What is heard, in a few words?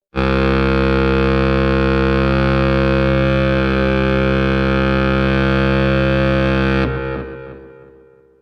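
ship horn, funny cartoon sounds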